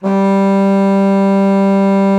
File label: G3, bassoon; Xsample VOL-6